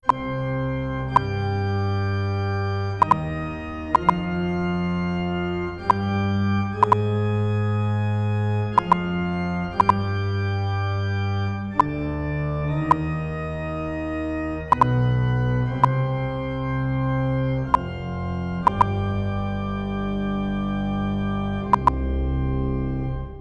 real organ processed

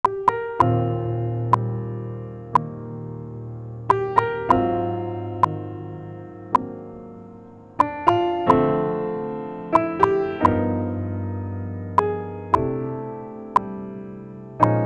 piano

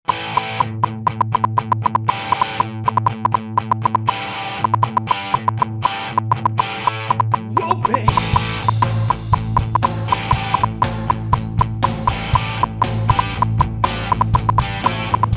rock processed; pertusa; generated sounds Leveau

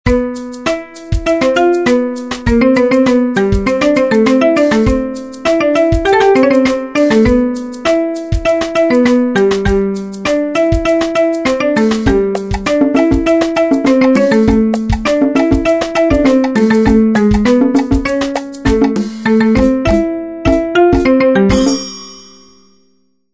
jazz

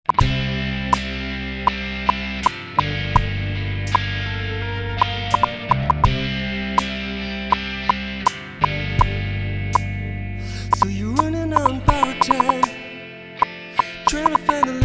pop processed; pertusa; generated sounds Leveau

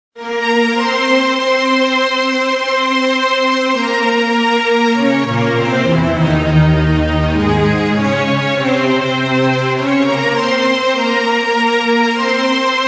classical1

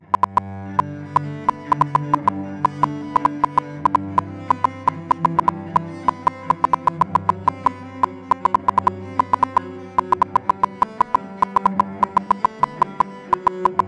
cello processed; pertusa; generated sounds Leveau

